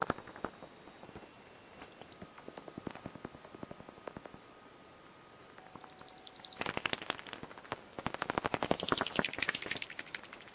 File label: Batscanner